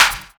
Clap; Equipped Music; Loungin House

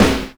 Extract Snare; Sonic Foundry (Sony Creative Software); Drums & Drones; Construction Kits

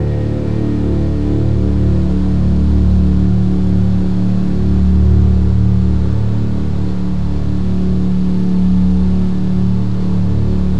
CELLO

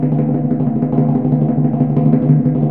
DRM, Orchestral Perc; Roland LCDP03

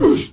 MALE WAR, SHOUT

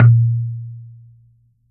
coconut; Unique Instr

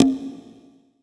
Open Conga Hi, percussion reverb; MARKYDRUMS

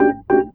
E.PIANO, KEYS

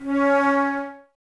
Flute, Woodwind

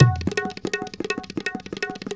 INDIA R, Heart of Asia, IND ENS

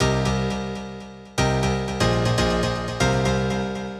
FX Piano (120BPM), retro house

